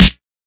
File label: old school short reverb steel snare drum b key